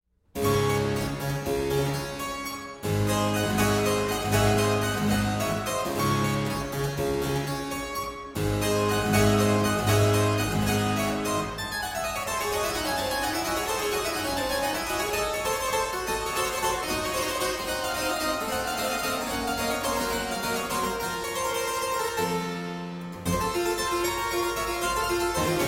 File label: Harpsichord Sonata No. 15 in D Minor; Keyboard Sonata No. 15 in D Minor